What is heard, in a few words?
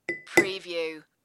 Bowl Spoon Bang